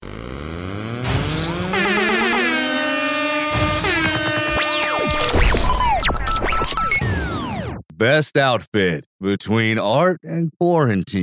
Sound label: jingle